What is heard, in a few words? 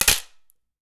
Palette Snap, Wooden Palette